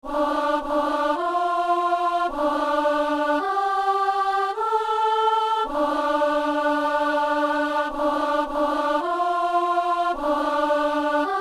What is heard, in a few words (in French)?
messe simple, kyrie, soprano; Soliste et soprano